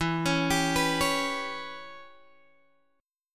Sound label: E Minor 6th guitar chord, Open D